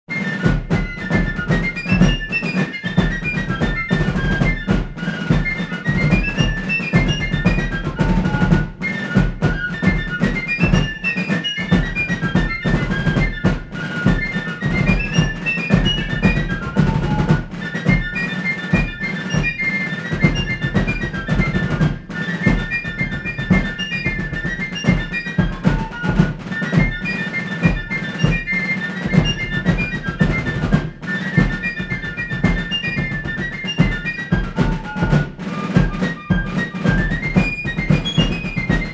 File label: Drums